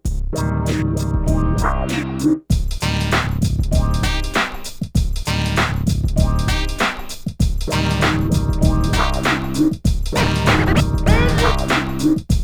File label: 98BPM, Hip Hop; InVision Interactive; 40 Oz Phat Slammin